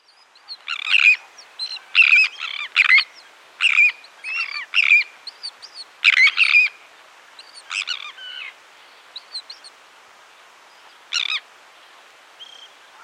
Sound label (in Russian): pestronosaya krachka golos